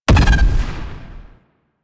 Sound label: Glaive Detonate Explosion; Warframe; Weapons; Ghost Catcher